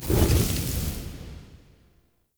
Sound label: Idol Mode In; Powers; Orengall